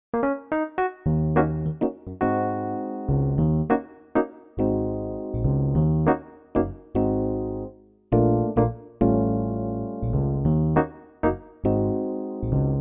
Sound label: EPiano